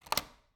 Cabin, front door, deadbolt, lock, latch, thick, open, loose, wide; Eiravaein Works; Vaeyan IV